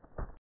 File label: deep footstep single